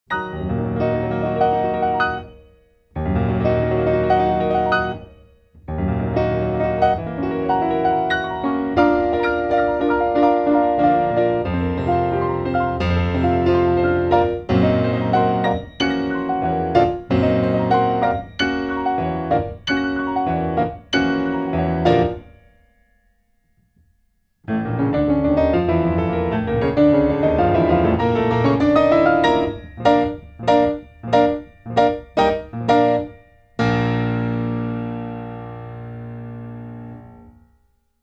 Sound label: Piano Concerto No.1 In E-flat major; Takt Op. Destiny